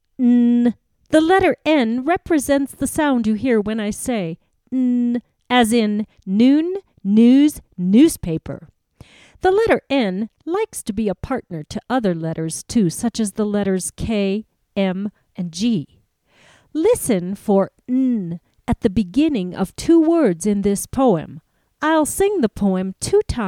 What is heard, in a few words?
Whistle